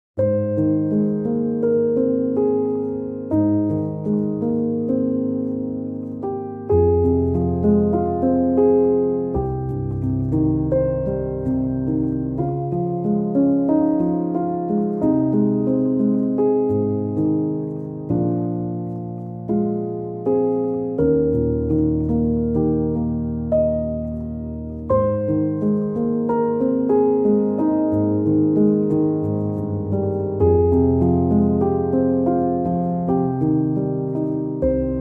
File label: song calm nature; dolphin tunes